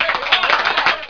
applause

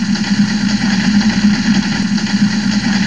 Welcome Sound; Trade Data Manager 1.5

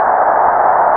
crowd; wipeout

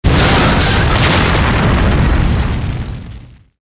UFO HIT, disasters

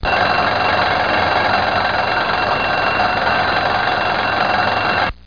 ALARM; SoundEffect; Talking E-Mail

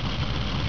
Pencil; Kid Pix Demo

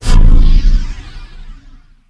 Foton Gun; weapons; ImpGal2; spacewar

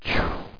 MISSILE

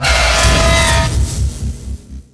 talos attack; DAoC

